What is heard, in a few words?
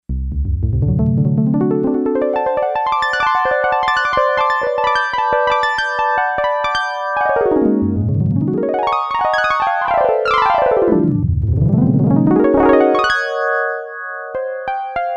Harp, Yamaha DX11; Factory Preset Voices